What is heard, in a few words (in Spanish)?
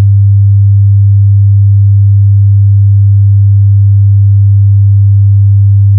100 Hz pasivo